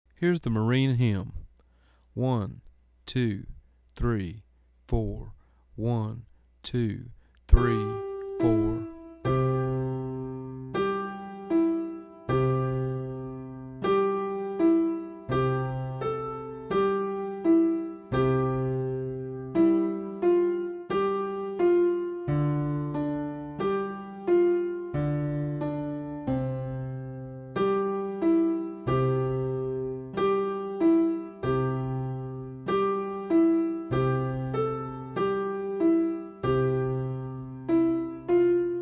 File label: Marine Hymn Slow